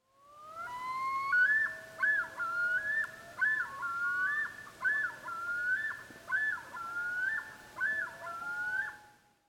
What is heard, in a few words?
loon sound yodel